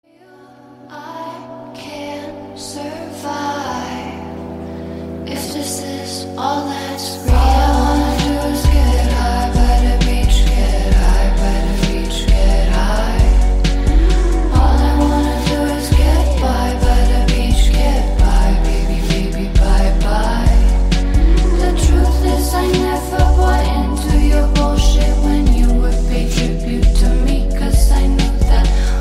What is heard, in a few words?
sad ringtone